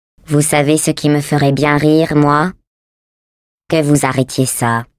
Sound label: taunt laugh, fr; GLaDOS